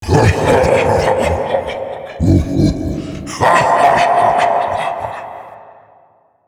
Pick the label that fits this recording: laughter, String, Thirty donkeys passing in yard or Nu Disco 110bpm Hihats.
laughter